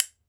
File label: B. BONES, Celtic Percussion; E-MU Producer Series Vol. 7 – Old World Instruments